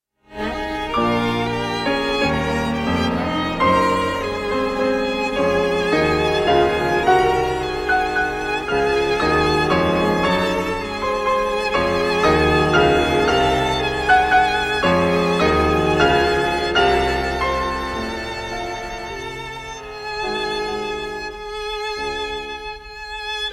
piano quintet in f minor op 34

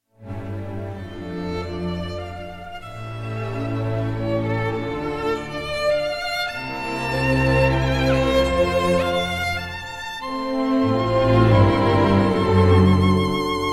violin concerto in e minor; felix mendelssohn; op 64

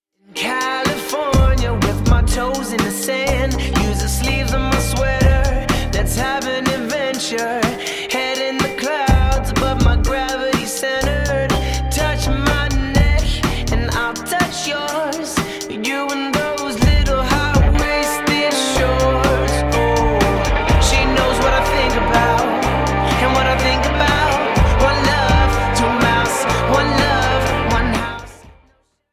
flute

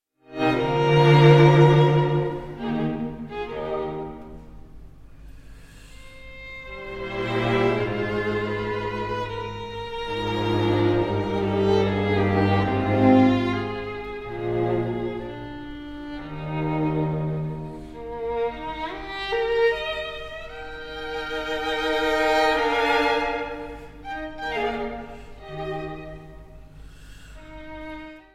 string quartet in B flat major; wolfgang amadeus mozart

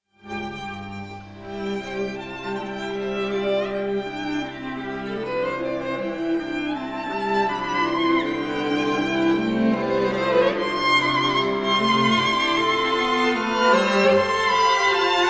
string quartet in e minor; allegretto lirico

